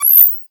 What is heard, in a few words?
Sci Fi Interface